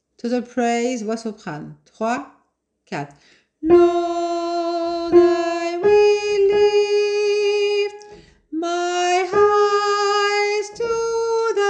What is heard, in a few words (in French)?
voix sop entier